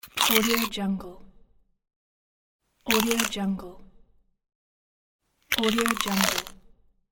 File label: crunchy apple bite sounds